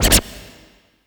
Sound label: Scratch H, Yamaha PSR E305